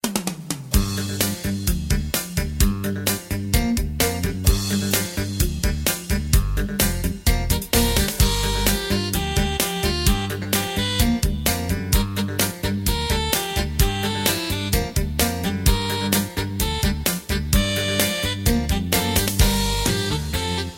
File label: music